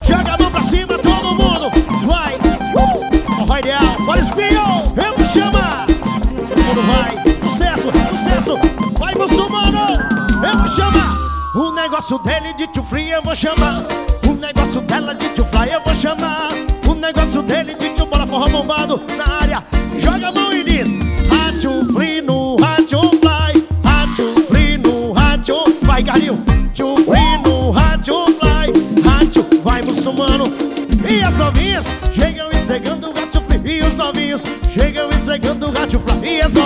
forro

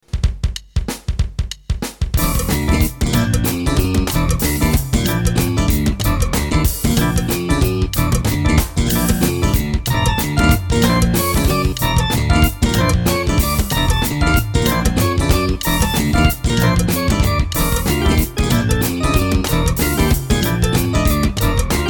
jazz

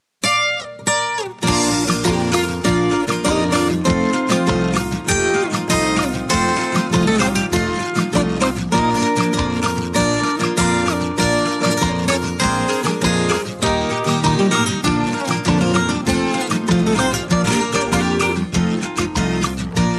vinheta

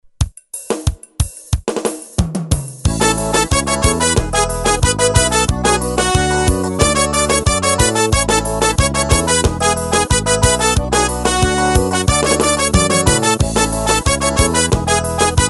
ritmos teclados

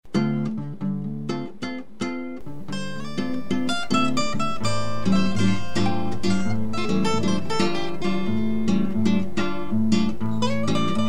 ideia solo samba

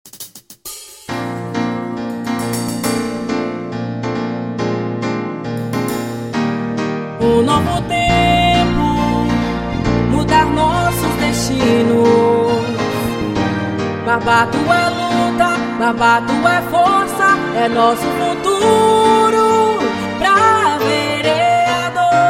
música de campanha vereador voz; vereador barbato

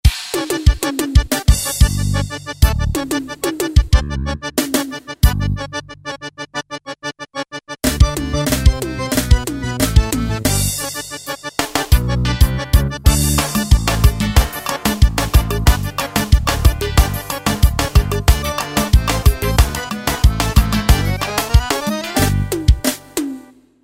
ritmo produzido para roland g70

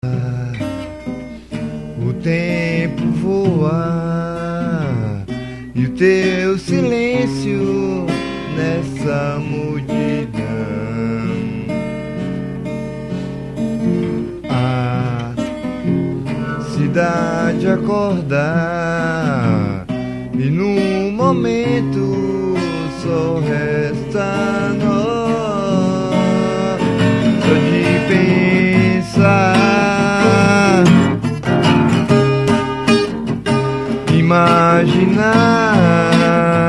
bossa nova

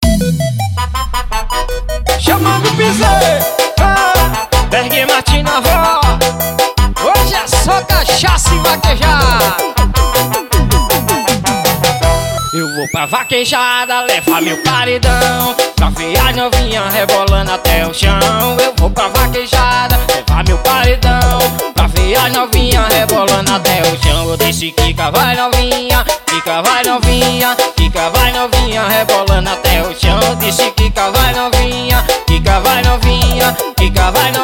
piseiro

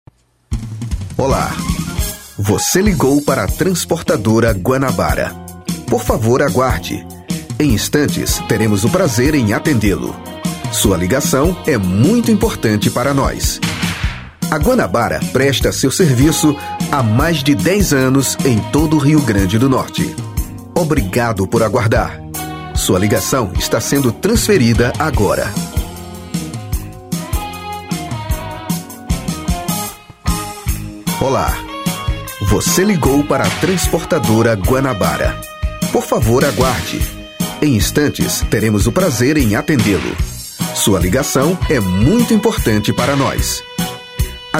exemplo de espera telefônica

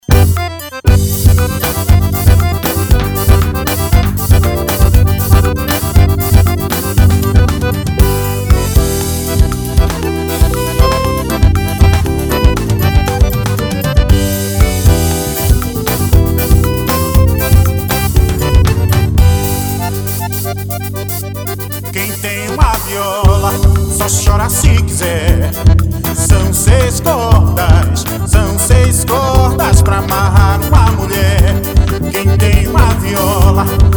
quem tem uma viola

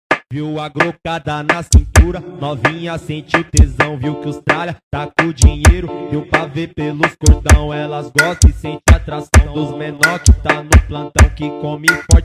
arrochadeira pra paredao

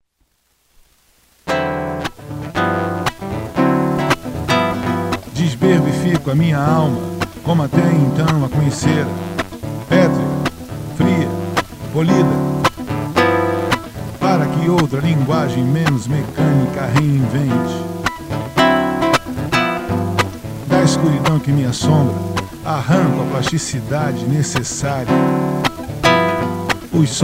viola

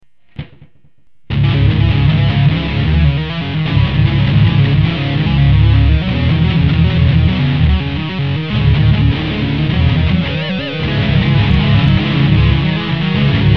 guitarras

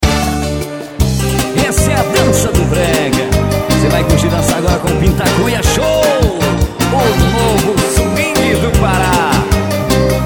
dança do brega

